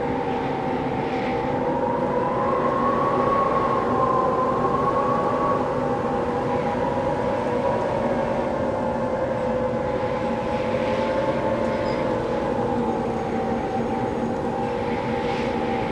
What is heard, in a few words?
Mountain Window Wind Tunnel Sm Loop Stereo; Journey